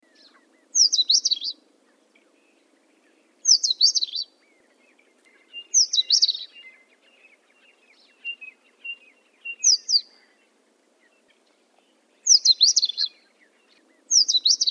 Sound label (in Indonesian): suara kacer india